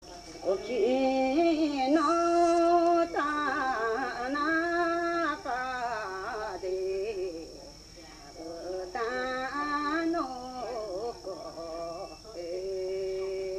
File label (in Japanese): taueuta, minyou